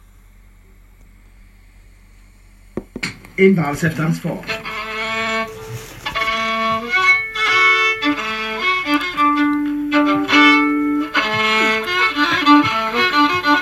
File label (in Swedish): Vals; stigtomta